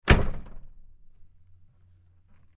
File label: Wagoneer Rear Tailga, jeep wagoneer